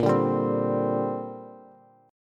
Bb 6th Add 9th Bb Bass; Bb6add9 strummed

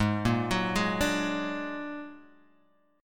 Bb Augmented 7th Ab, chord

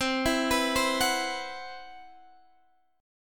C Major 7th Flat 5th C, Guitar Standard; CM7b5 arpeggiated; x,15,14,16,13,14; JGuitar

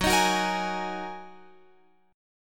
F sharp 6th Add 9th F sharp down Guitar Open F; strummed; F#6add9

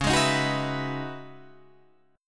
C sharp Minor 11th C sharp, Guitar DADGAD

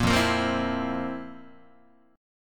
F Major 7th Suspended 4th F, Guitar; FM7sus4 strummed; JGuitar

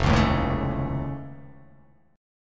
F Augmented 7th F, chord

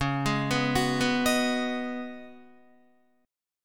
Db 7th Suspended 2nd Suspended 4th Db Guitar; 8,11,11,11,11,11; 4F,4A,5C,5G,5C,6E; arpeggiated; chord shape; JGuitar

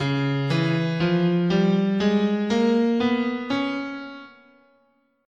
C sharp Hungarian Major, scale

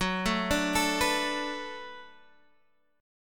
D 6th Fsharp, chord

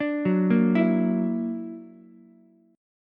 Gb Augmented 7th Gb down Ukulele; Gb+7 arpeggiated

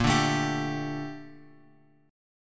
Bb Major 13th Bb Bass Standard; strummed; JGuitar

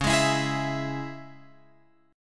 C sharp Minor, Guitar Open F; C#m; strummed; JGuitar

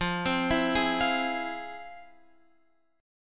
F Minor Major 7th, Guitar Open F, chord; FmM7 arpeggiated; JGuitar

